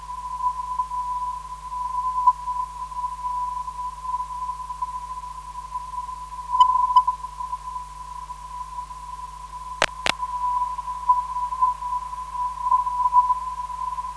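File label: THR 306.6kHz, non-directional beacon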